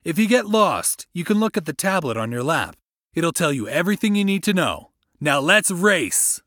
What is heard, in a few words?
Race Complete; VR Karts